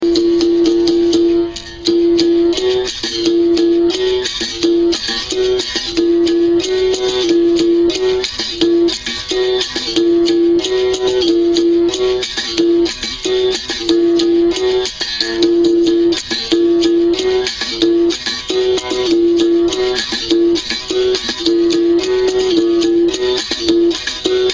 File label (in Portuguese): toque de sao bento regional